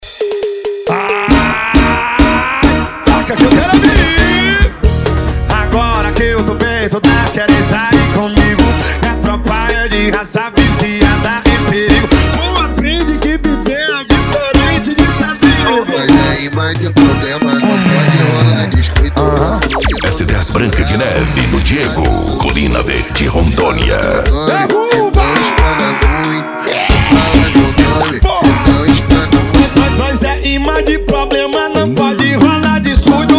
esp forro

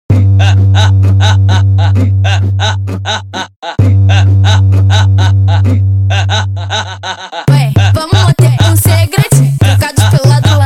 pancadao funk bass

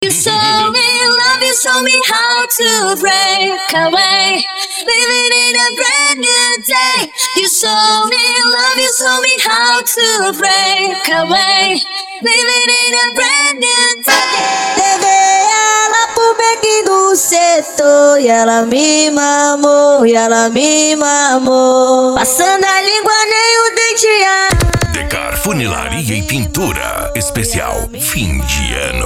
funk ritmado

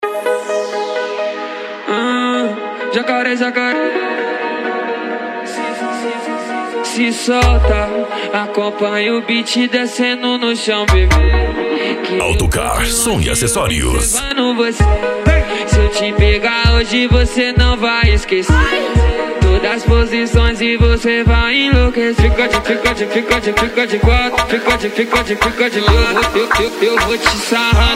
funk agressivo ritmado e funkadao

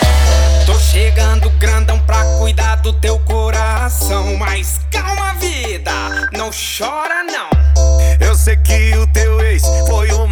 funk mega funk

